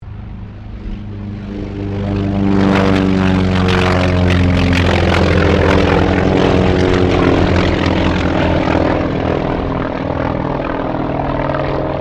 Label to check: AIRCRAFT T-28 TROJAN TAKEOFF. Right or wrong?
Right